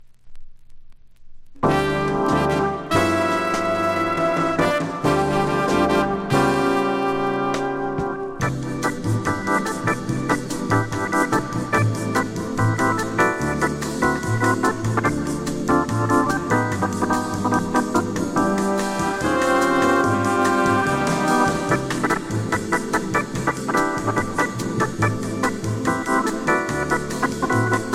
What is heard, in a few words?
Rain Forrest